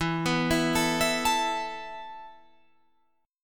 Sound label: E Suspended 4th E, down, Guitar, Open F; Esus4; arpeggiated